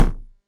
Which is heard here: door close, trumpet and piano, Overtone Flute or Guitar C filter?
door close